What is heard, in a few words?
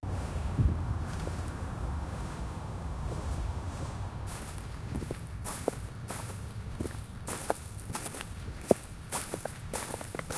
person running on grass t; human actions